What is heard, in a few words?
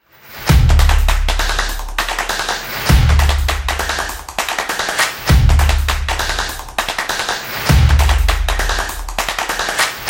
Fast Beat; graffiti